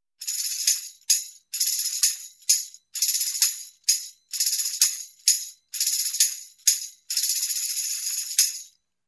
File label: Tambourine groove rattles and hits